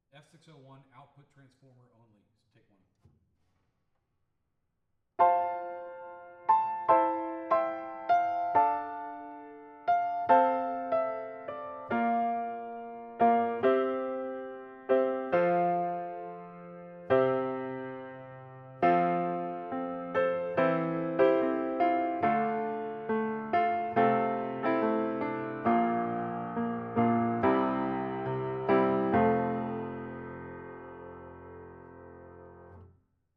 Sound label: Piano; mic pre shootout